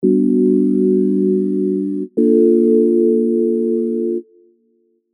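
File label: Sylenth1 old skool synth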